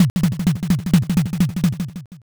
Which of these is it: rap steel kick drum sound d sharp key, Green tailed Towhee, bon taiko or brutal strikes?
bon taiko